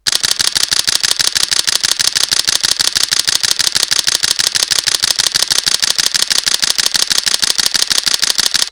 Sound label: continous H JPEG, Canon EOS-50D